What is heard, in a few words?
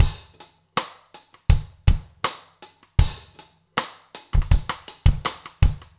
trip hop rims